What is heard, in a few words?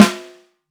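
Acoustic Snare; Roland SPD-S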